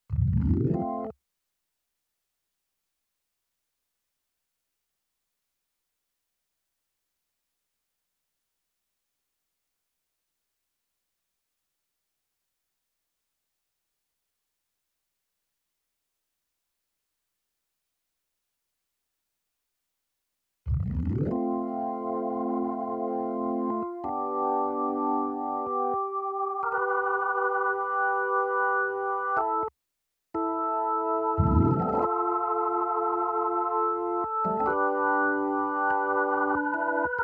Keyz R

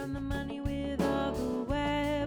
Rough Mix L

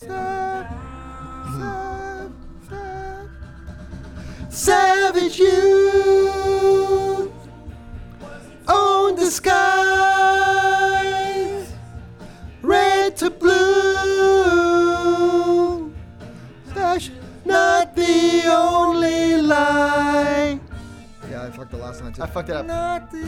VOX Back

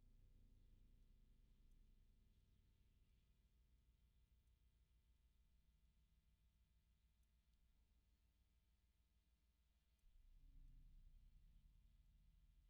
Bass DI

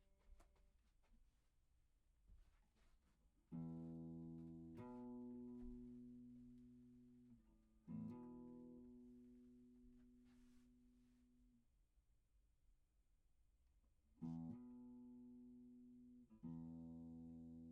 Act Guit R

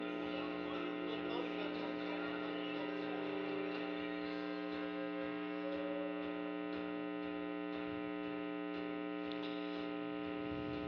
VOX Vamp

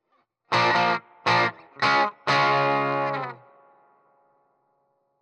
Heavy GTR R

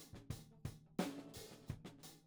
Tom1

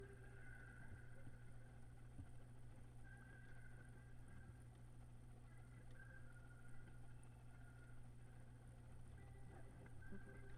Leslie gtr

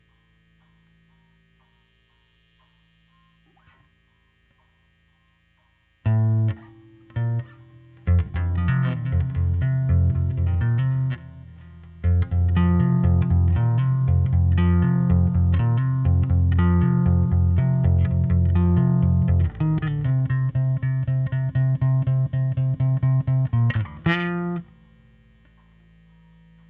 Bass OD

